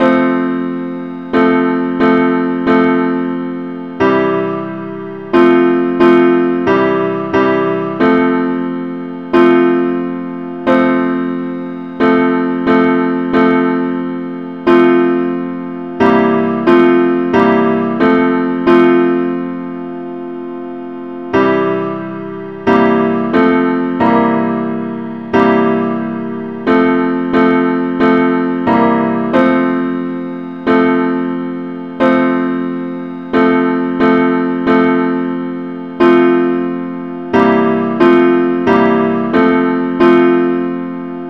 henley simple acc key f

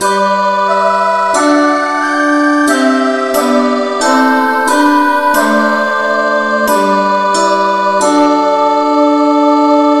no vocal key G

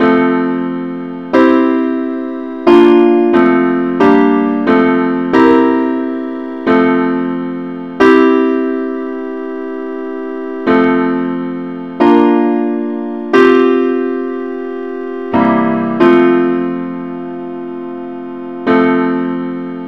simple Acc key f

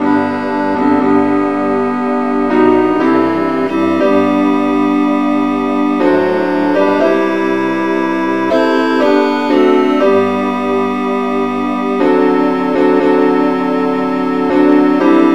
satb key C

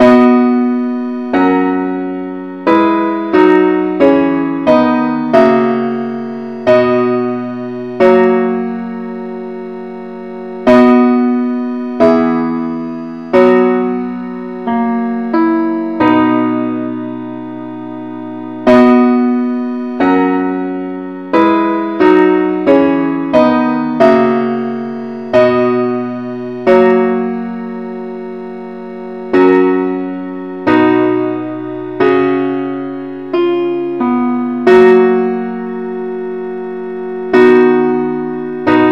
parts only key b

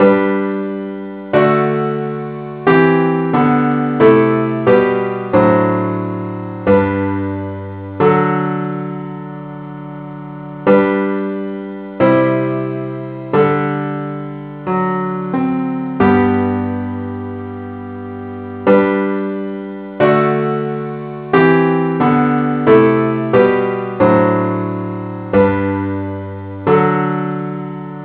parts only key g

